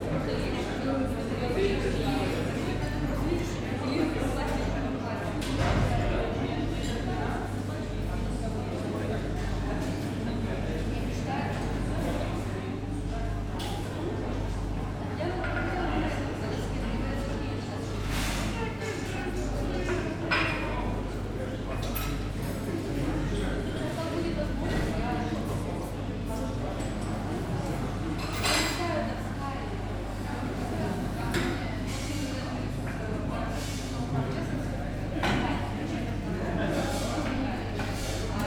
room tone, large cafe in university, loud conversations, A-B stereo, Moscow Ambience; Alexander Kopeikin